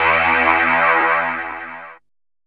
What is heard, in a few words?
DR. LOOP, TRANCE; X-Static Goldmine 3